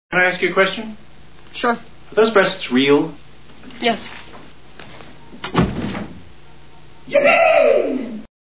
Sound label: yipeee